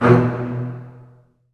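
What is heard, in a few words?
Bass Spiccato B1; Digital Sound Factory Virtuoso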